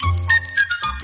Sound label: keys, jazz loops